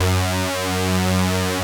POLY SYNTH; Wizoo - Powered Wave

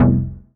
stab daft F, Noise; stabs

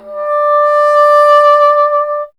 WND BSN D, Bassoon; Miroslav Vitous - Solo Instruments VOL-1

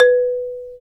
Bass Marimba; Roland LCDP11; Africa VOL-1; Af.Marimbas

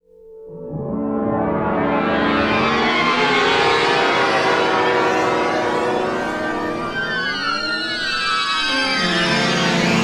Warbled, Ambience; Sonic Foundry (Sony Creative Software); Numina II

